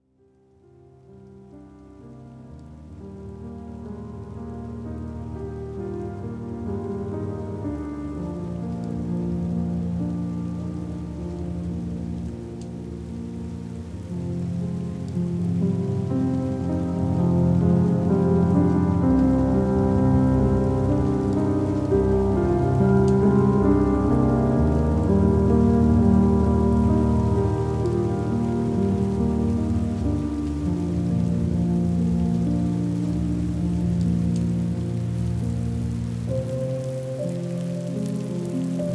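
Rain Noise - Evening Rain. Sound And Noise Of Rain, Thunderstorm, Hurricane; Vechernii Dozhd. Zvuk I Shum Dozhdia, Grozy, Uragana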